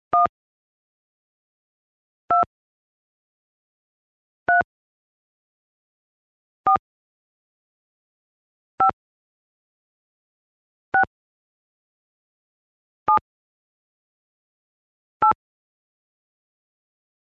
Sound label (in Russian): telefon